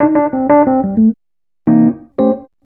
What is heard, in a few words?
ACID JAZZ